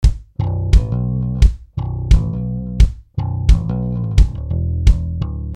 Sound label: Kick Sample